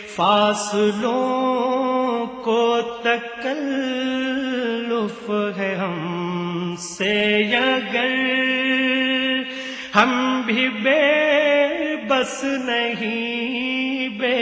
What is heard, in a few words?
islamic naat